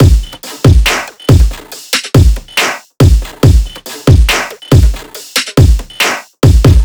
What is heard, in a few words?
drums 140, Full Drum Loops; Slate; SEISMIC Drum Sample Pack